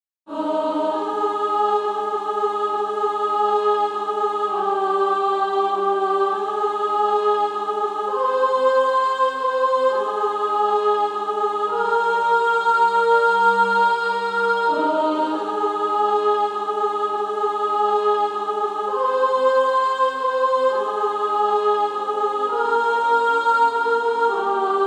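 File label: Female Choir, soprano 2; SSAA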